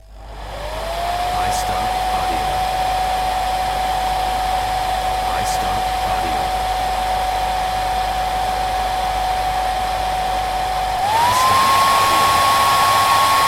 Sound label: hair dryer